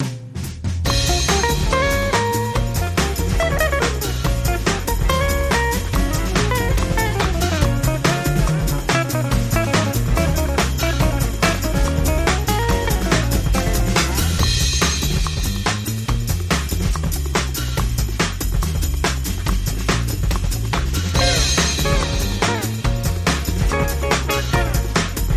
Jazzer Up Guitar

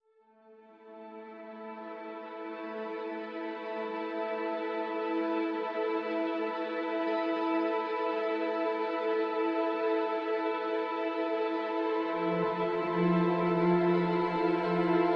Curtains Falling, Ambient

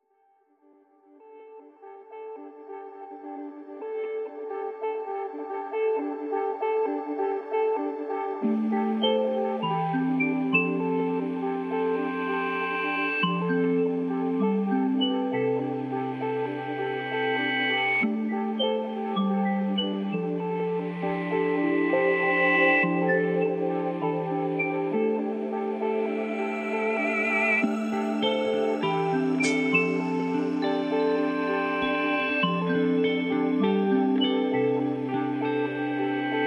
No Drums Mix

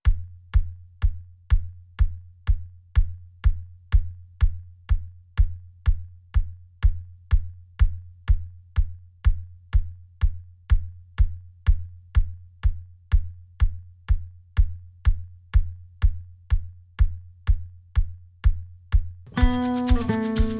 No Guitar Accompaniment No Keys

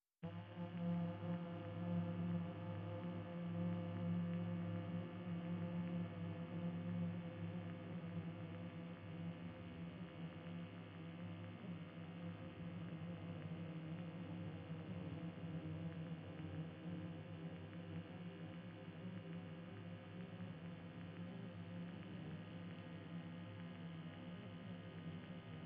Ring Modulator Only

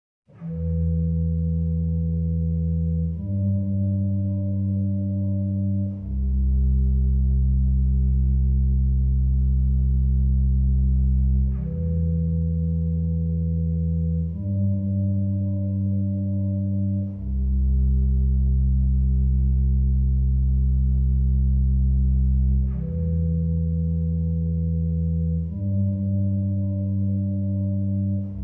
Drums and Bass Mix

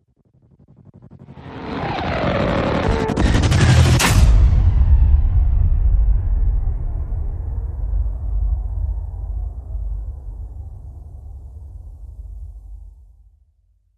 Hit Standpoint

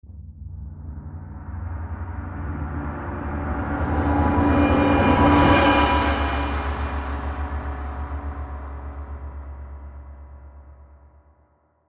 Low Roll

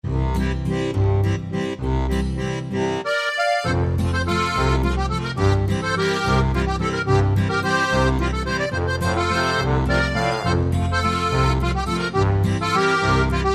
Waltz

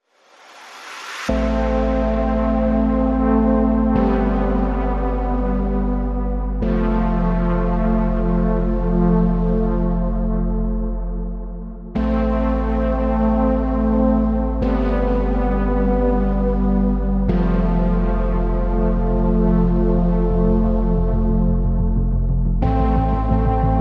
no lead synth

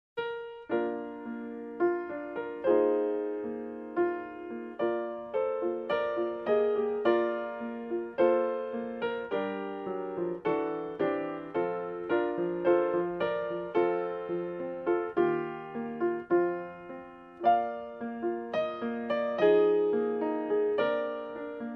Oh Come All Ye Faithful, Solo Piano

